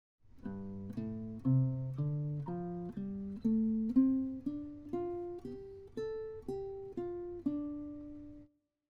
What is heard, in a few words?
D minor pentatonic scale, guitar; This is a D minor pentatonic scale using shape 3